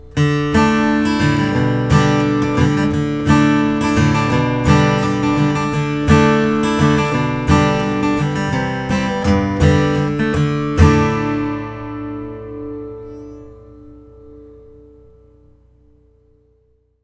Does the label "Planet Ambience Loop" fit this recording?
No